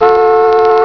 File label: horn